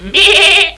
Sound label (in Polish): koza; Little Red Riding Hood